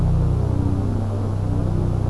atmosphere

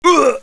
get hit; eqclassic

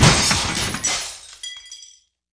metal

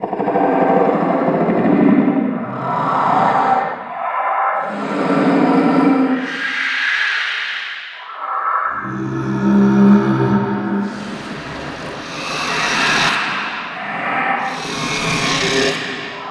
soundspace prison; Canals

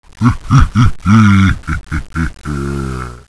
zombie idle; boomario

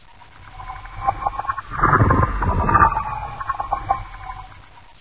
howl; floater

